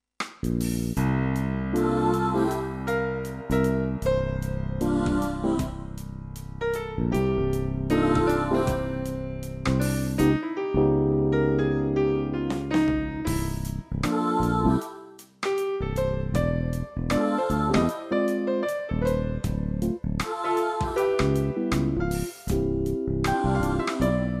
gospel, sax

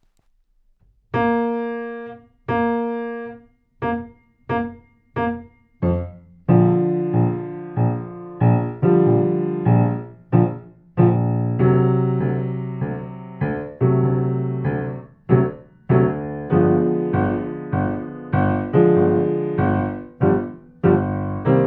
Bb 4 bars, sax

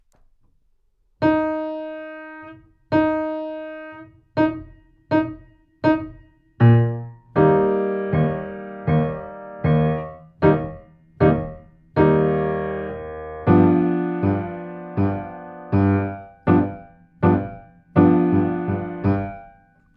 Eb 4 bars, sax

